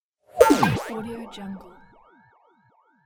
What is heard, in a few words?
zap cartoon